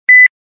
Low Beep Once; LG; VX11000 enV Touch

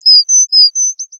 Sparrow, notifications; HTC Rhyme

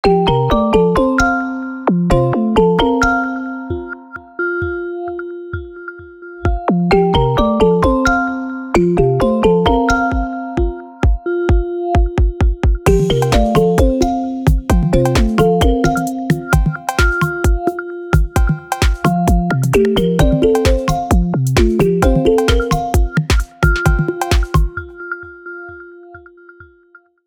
Pulse, ringtones; Huawei; P30

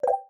Video Record, ui

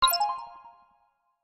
Space close; Nokia; audio themes

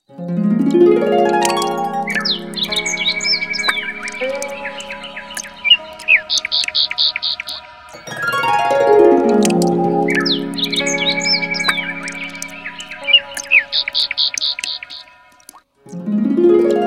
Birds, ringtones; Coolpad; Dazen-X7

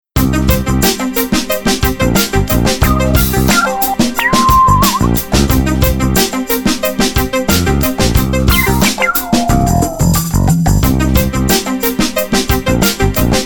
Dream tune, ringtones; Sony Ericsson; C902

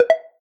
Stereo Disappear; Lenovo; ZUI 13

